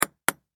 Sports Ping Pong Ball Hit Double; Ping Pong Sound Effects; Ping Pong (Sound Effects:Sports); SFX Bible 6

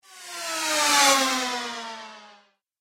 Space Bike Flyby, spacecraft sound effects

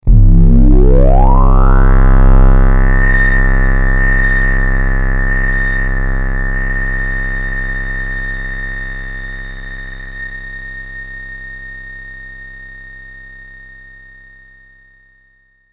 Stab Synth High, Pitch, Ring, Science Fiction; 5.1

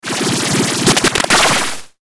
Stutter Spinner Distortion; Stutter Electrical Distortion; Blastwave; Production Element Imaging Elements Accent Transition

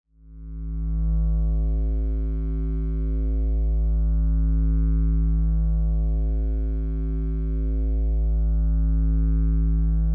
Drone Electrical; Sound Design; Drone Sound Effects

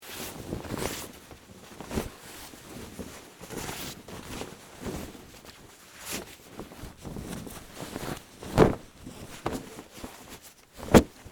Canvas Tarp Fold, Foley, Canvas, Tarp, Fold; Rustling Sound Effects; Objects; Blast Wave

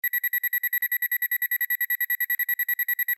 Sci Fi Alarm Device Warning Beeps; Control Panel

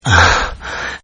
Grunt Breath; Grunt Sound Effects

